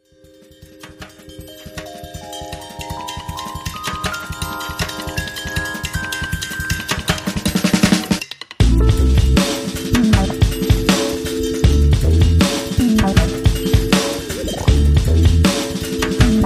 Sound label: Rain In A Bamboo Groove